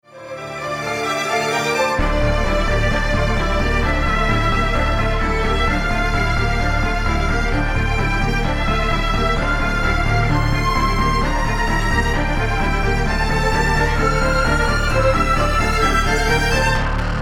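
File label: Strings Homophony Bass On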